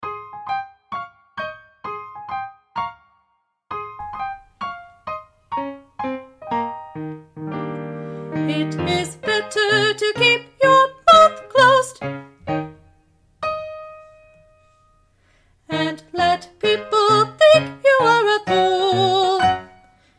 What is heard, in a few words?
Soprano